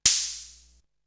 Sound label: Open hat; ZERO G - Datafile DISC 3